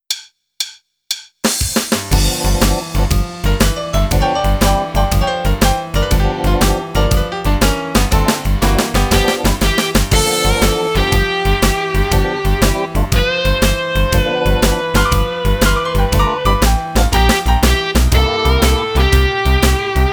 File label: Blues